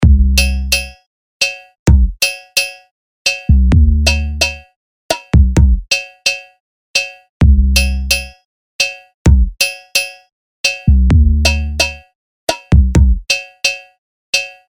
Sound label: BEAT